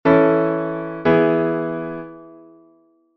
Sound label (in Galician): plagal en MI M; armadura 4 sostidos, dous acorde: mi-do-mi-la; mi-si-mi-sol